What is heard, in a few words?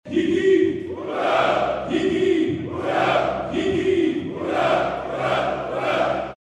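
sounds and shouts of hurray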